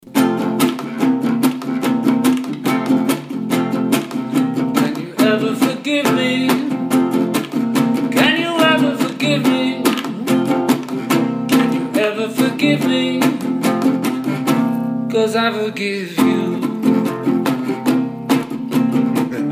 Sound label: Acoustic